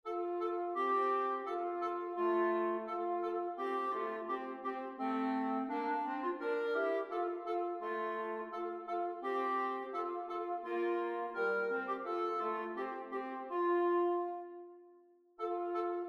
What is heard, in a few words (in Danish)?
duet klarinet horn; Levende musik for boern